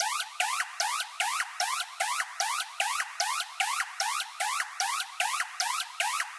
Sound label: synth background